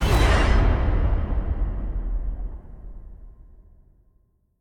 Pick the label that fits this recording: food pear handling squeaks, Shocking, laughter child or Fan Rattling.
Shocking